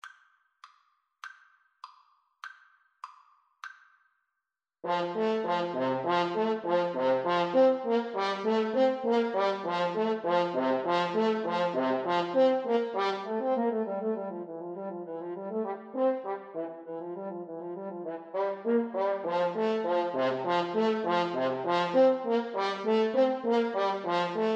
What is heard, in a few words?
seraglio duet HN, French Horn Duet